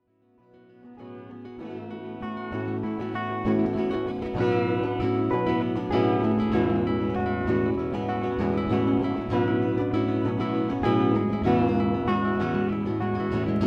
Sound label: loop